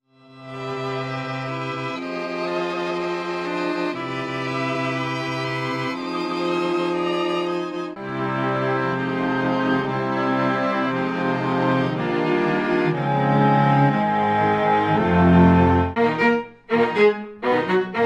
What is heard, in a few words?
String Dance